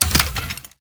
mech open

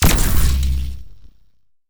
atk laser gun

